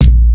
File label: KIK